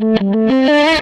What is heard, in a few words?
Les Guitar Loop